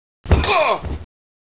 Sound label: bandit die forwards; gearbox